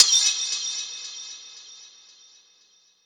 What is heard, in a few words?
perc werid hit; one shots; SEISMIC Drum Sample Pack; Slate